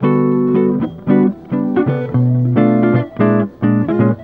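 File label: JAZZ GUIT GROOVE; Best Service; World Colours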